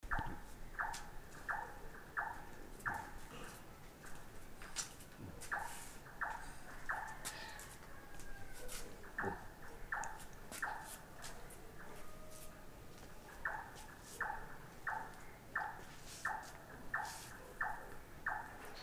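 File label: Large Tailed Nightjar Da Lat, vietnam birds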